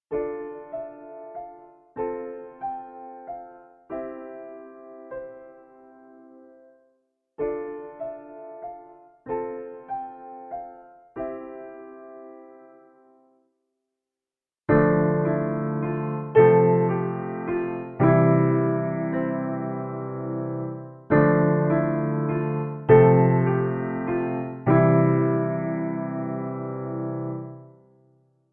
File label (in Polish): piano i forte; Nagrania Lokomotywy